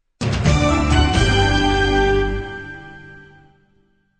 Sting

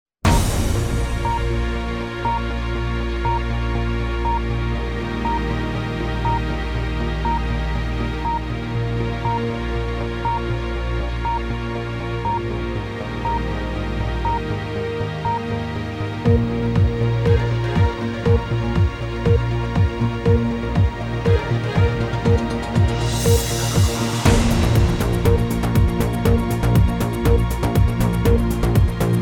Countdown Remix; BBC News

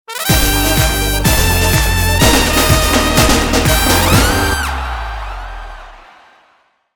Brazilian Sting; Sitting Duck Music; Good Morning Britain

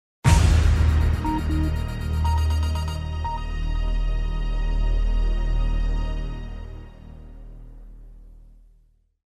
Breaking News Sting; BBC News 24

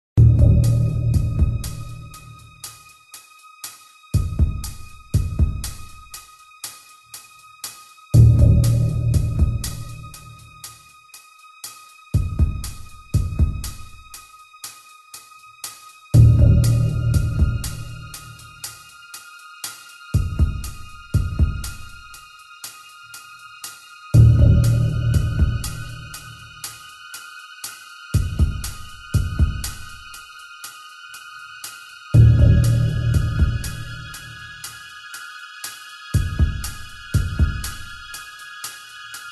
Countdown; The 100K Drop

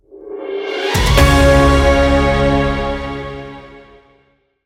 News Sting; Daybreak

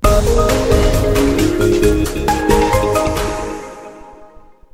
sting; gmtv 2009

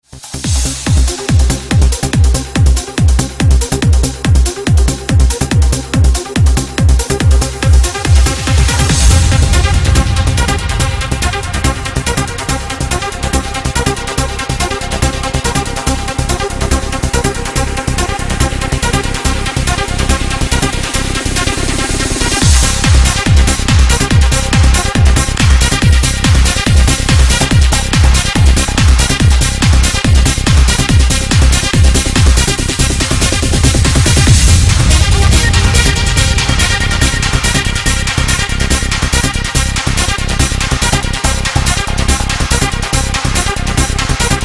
NEWS Countdown; BBC NEWS 2012

